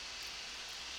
Background Noise

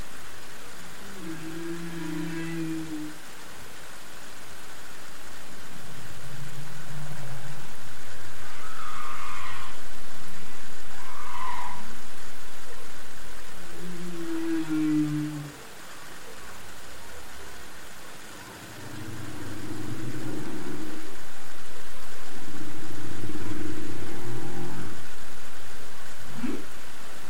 Hydrophone

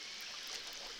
Background Noise Clips

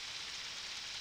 Background Noise

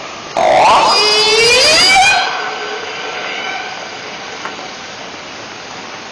Orca Lab Luna; Sea Sounds

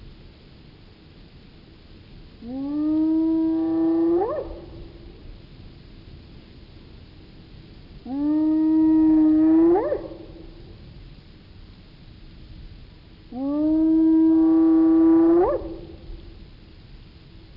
Spotted Hyena

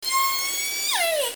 call S1

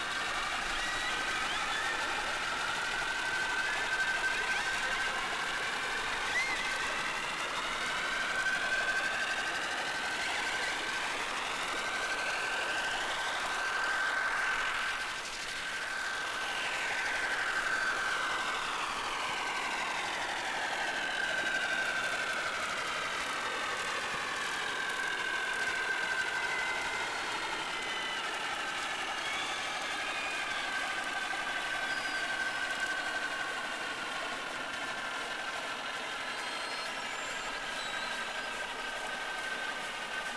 Whales; SeaSnake

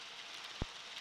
Background Noise Clips

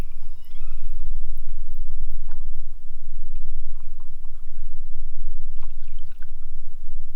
toad noise

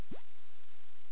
CHIRP, Sea Sounds

